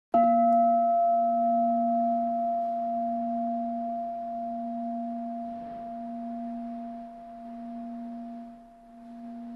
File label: temple bell